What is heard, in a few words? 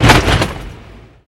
Car Hard Suspension; hits & rattles